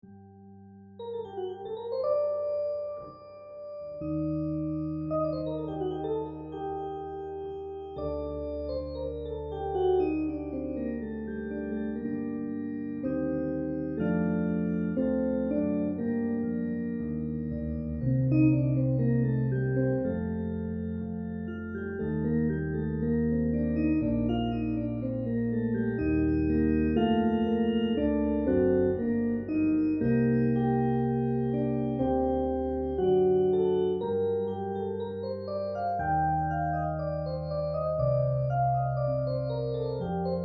Organ

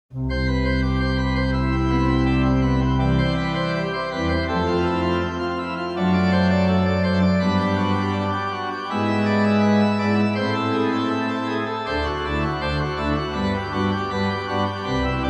Organteq; Bach BWV 553 Praeludium

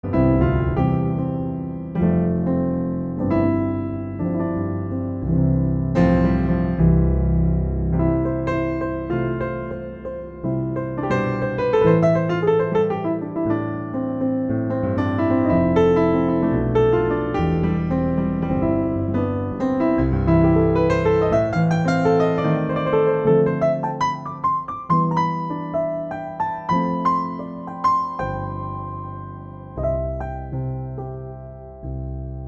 Bluethner Natural Hyperbright

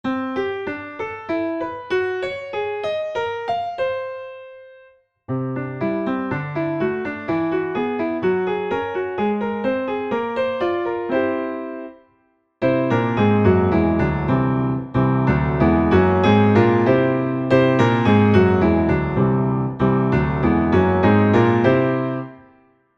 Circle 5ths clockwise anticlockwise

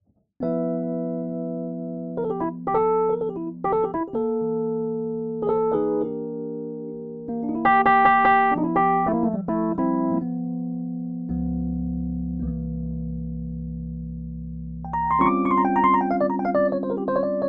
Rhodes MKII

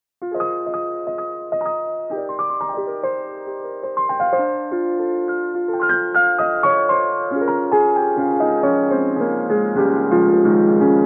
lofi

